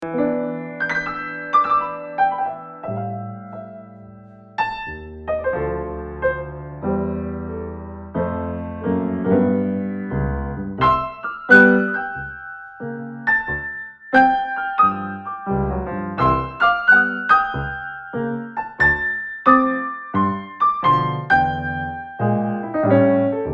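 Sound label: Strolling Blues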